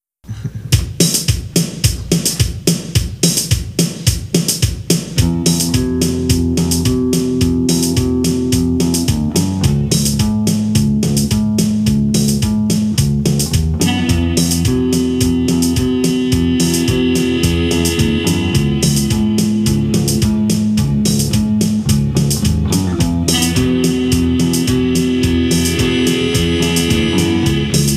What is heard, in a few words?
Boogie Stomp (Short Neck Version)